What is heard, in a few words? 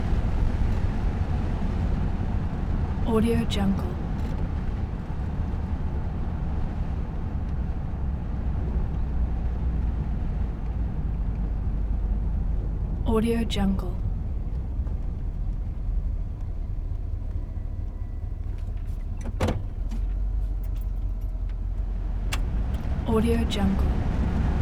1964 cadillac